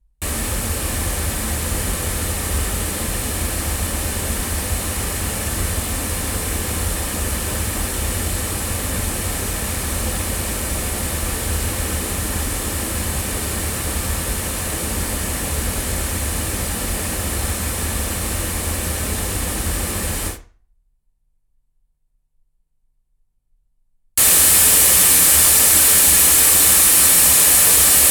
Sontronics Helios omni loudspeakers, microphones recordings